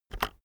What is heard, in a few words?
keyboard external enter press very fast soft